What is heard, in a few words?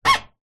vehicle car tyre rub squeak metal